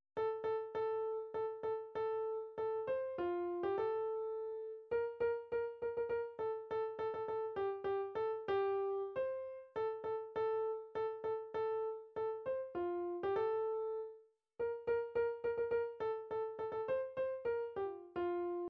jingle bells, midi

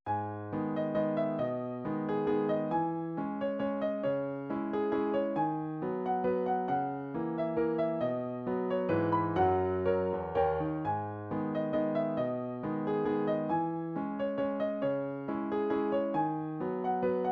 waltz, midi

